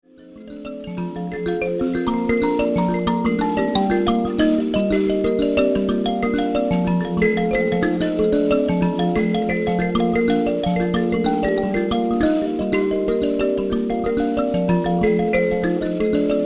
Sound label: mbira